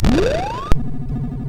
Die Alien Slime, MOS6581R2

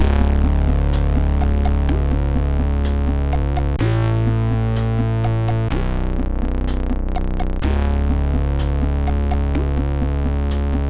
Geister, MOS6581R3

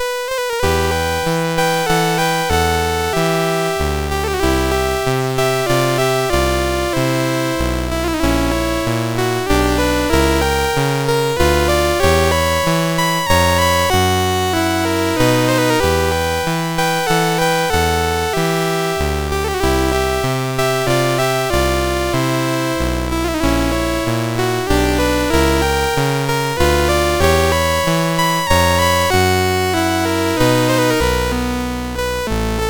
Waltz, MOS 6581R4

